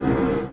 brachiosaurus hurt; paleotest

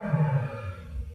triceratops death; paleotest

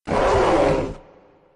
spinosaurus hurt; paleotest

